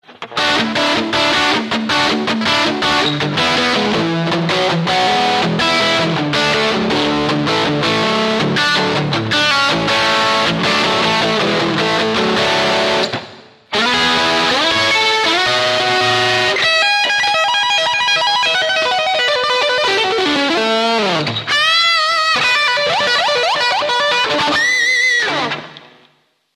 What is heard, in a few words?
hot harmonics